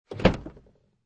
Car Interior Door Open, Car Interior, 1996 Acura Integra, Door Open No Engine